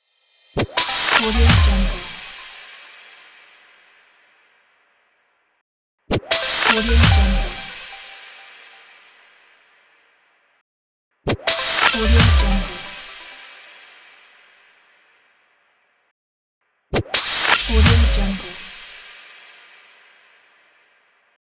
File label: tutorial logo